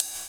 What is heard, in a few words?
Ride; Equipped Music; Loungin House